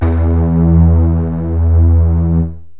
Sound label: breath lead, yamaha rm1x synth; bass and lead